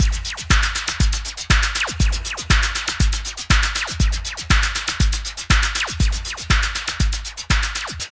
LOOP, Tekno Industrial; East West

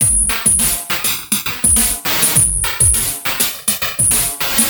Drum Fx Loop, Electronica; Sonic Foundry (Sony Creative Software) - Electronic Point Blank

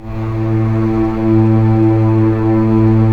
Orchestral p Slow, String Sections; Roland LCDP13

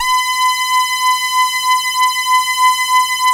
saw res dry, synth

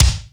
KICK, DRUMS; Crypton - DrumBase5000; T1 DRUMS

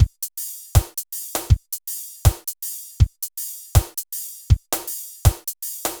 Synth Loop, BPM 80

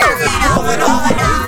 vox FX; Big Beat Samples & More

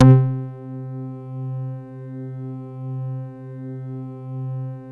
BASS C3; Best Service Dream Experience; R100M BASS

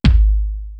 house kick; East West; Global Sounds

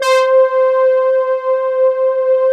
FATTY PADS, JV PADS; Pro-Rec - Synthsonic

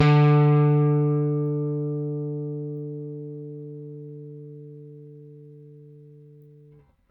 RickThumb-C, RickenbackerBass

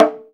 Conga Hi, Percussion Kit

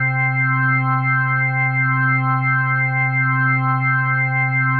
Single Note C2, Organ; Loops; Single Notes; Sonic Foundry (Sony Creative Software); Joe Vitale Organ Donor

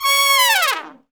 C#4, Section Horns

